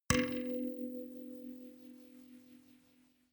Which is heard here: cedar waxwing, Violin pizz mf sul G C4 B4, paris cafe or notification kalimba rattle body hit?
notification kalimba rattle body hit